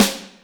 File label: Snr Classc RM, Alesis D4 drum machine